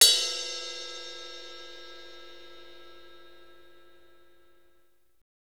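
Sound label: cymbal, Kit Dry; Northstar; Drumscapes Roland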